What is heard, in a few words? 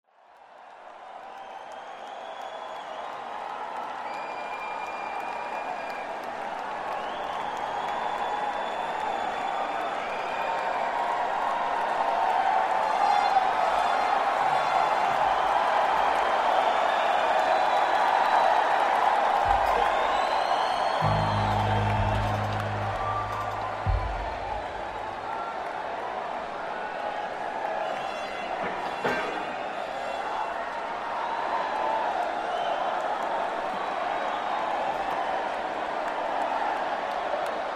Crowd; Holmdel, NJ; PNC Bank Arts Center